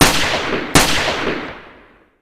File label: shot twice